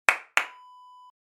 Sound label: hand clap